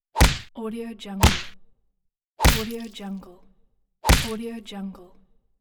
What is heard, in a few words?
fisticuff punches; moonaudioproduction